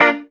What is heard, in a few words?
GIT CH A#; Sounds Good - Roots Reggae; GTR CHD